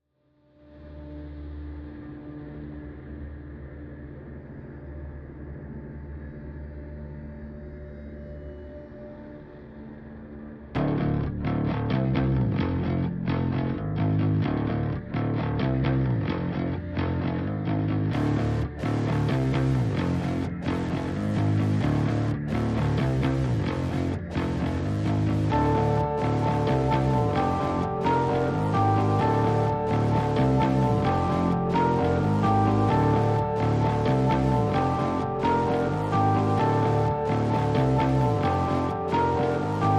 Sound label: No Perc